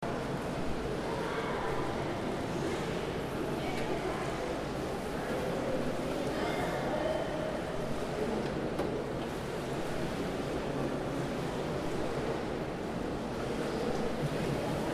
hallenbad

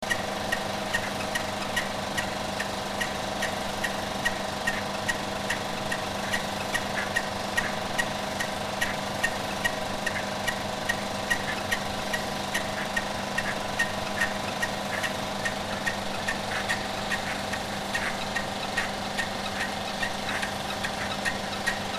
Kino 35mm Filmprojektor Umlenkrolle

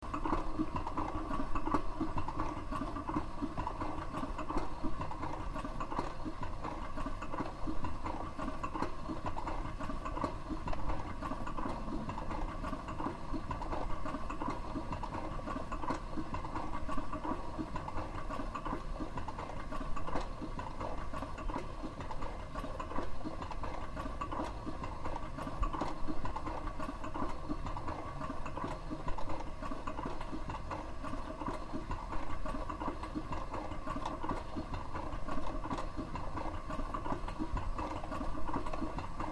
balgengaszahler

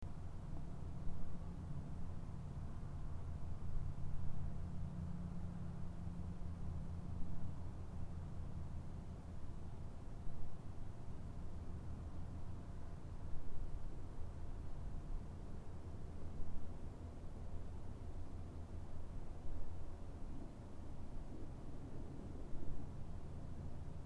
hochmoor

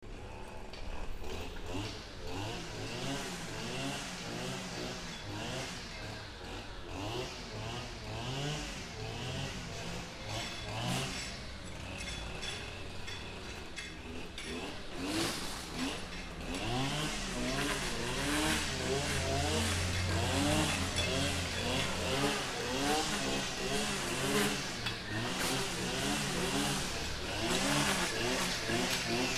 motorsense

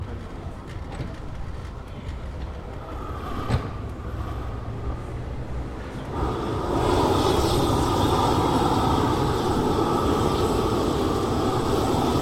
Anwaermbrenner, dachdeckerarbeiten

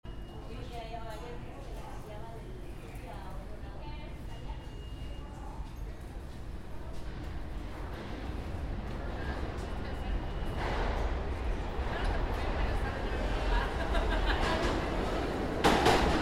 u-bahn nyc; Subway New York City

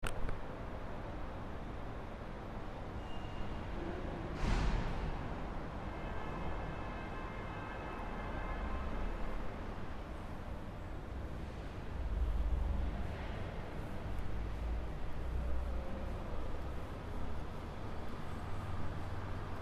parkhaus in der nacht

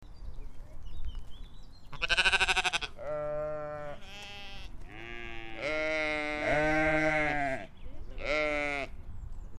Schafe

